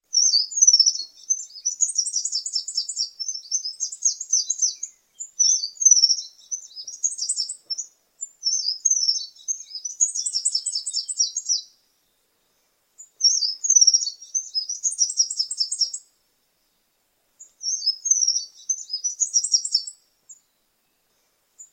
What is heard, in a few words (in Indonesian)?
suara burung madu polos